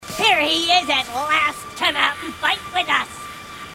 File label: grunt; h3dialogue; grunts